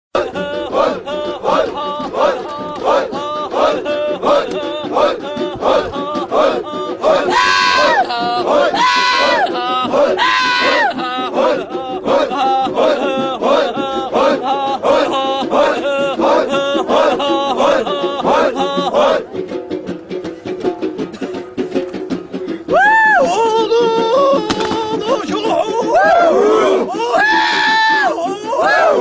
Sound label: Arab Tribe Dance